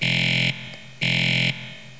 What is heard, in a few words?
error beep noise